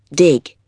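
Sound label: digital brass, keyboards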